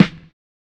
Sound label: LO FI SD, Drums; USB Soundscan vol.11; AKAI